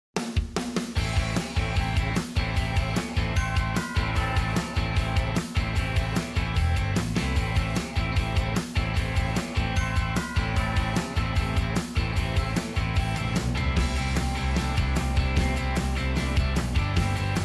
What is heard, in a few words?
rock